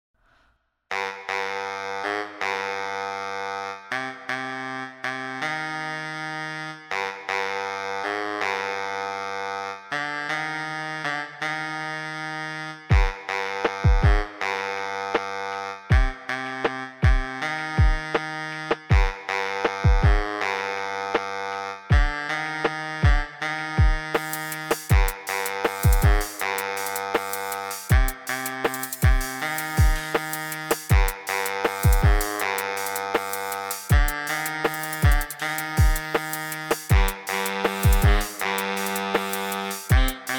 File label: brass baritone